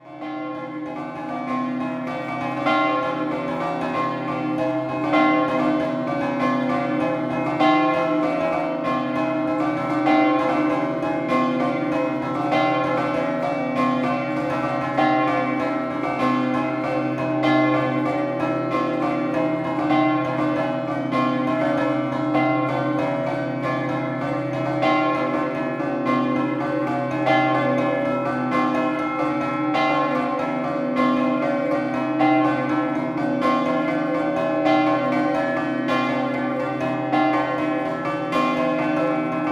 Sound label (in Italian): ENEMONZO, campane